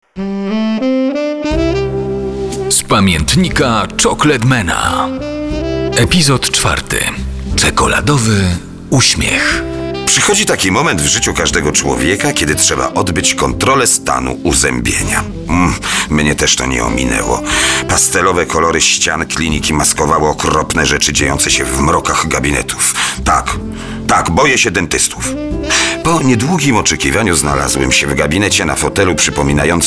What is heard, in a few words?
SAX